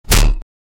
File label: a hit sound when hitting, combat